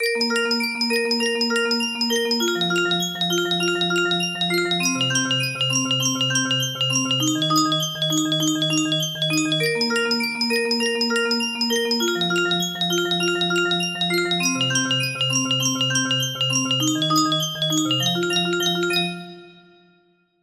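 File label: music box melody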